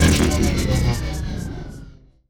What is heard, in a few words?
future weapons electro gun minigun stop; future weapons 2; soundmorph